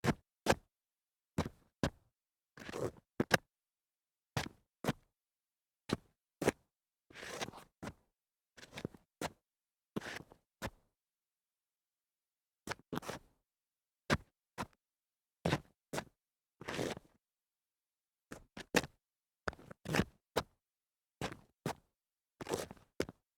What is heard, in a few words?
shuffling feet concrete